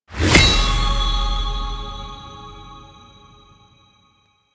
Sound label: UI Sockets Complete; DE; Warframe; Fusex Treasures